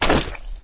jump up, flood

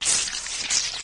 move, flood infection

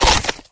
infector bite; flood

